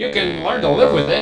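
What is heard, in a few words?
resynth envelope, transwaves; Soundengine - CSR-000 The Art of Transwaves; MOVING PART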